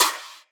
clap single hit f sharp key; single hits one shots

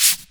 natural shaker sound sample g sharp key; tuned percussion

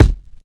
kickdrum sample f key; kick drum samples

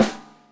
steel snare drum sound A#; snare drum samples

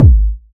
tight low end kick sample g sharp key; kick drum

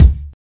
rich lows kickdrum sample c sharp key

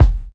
rich low end kick drum single hit e key; tuned drums e key

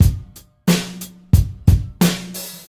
90 bpm drum loop sample f sharp key

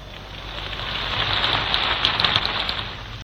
distant applause

